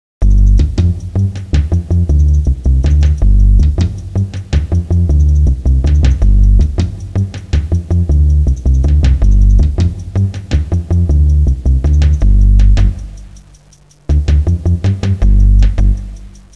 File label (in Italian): suonerie musica ritmica